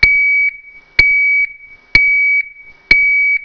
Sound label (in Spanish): intermitente